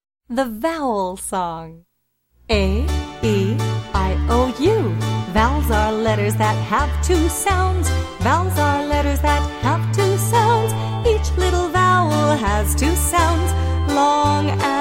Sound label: Vowel Song